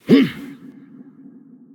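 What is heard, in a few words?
non verbal yay, voice; cernunnos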